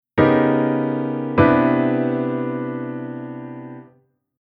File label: Chord Tension SubV7